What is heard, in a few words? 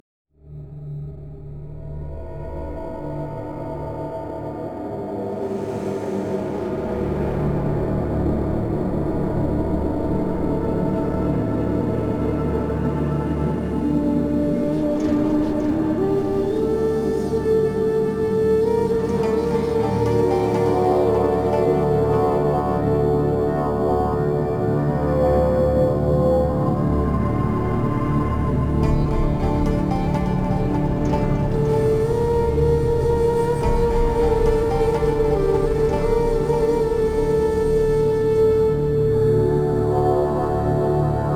desert voice instrumental